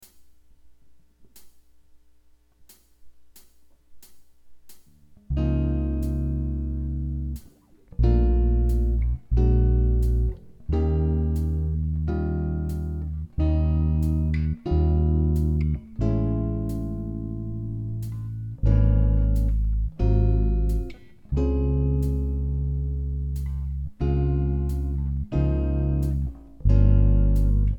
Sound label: chords bass